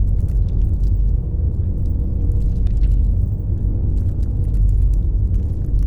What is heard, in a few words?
pro ship colossus idle; biogenesis